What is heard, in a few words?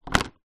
household dustpan and brush plastic down on wooden floor